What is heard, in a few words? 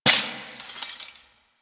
Desktop Glass Destroy; Warframe; Ambience; Entrati